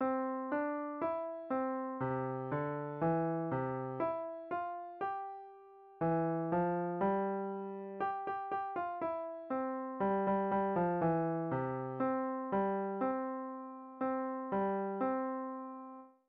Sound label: piano